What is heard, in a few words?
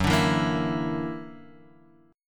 Gb Augmented 7th Gb down; Gb+7 strummed